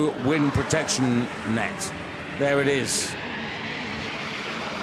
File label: takeoff from ramp; EAU CLAIRE HTS; THOMAS NAUNAS